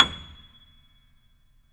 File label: BOSENDORF